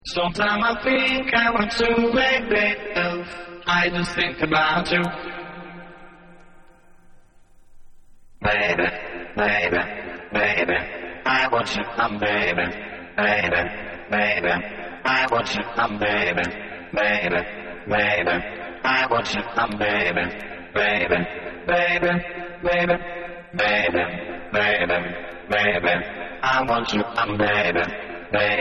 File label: Acapella